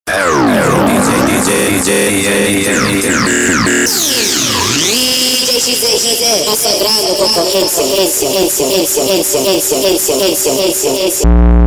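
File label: sped Up, phonk music; funk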